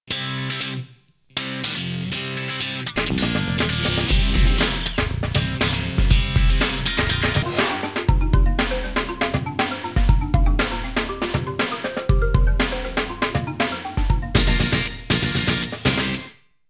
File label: HARDROCK